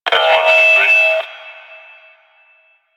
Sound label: Mall Stage Feedback; Hub; Warframe; Ambience; Nineteen Ninety Nine